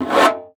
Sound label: STREI FG, BONGOS; Xsample VOL-9